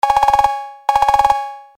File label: 808 Alarm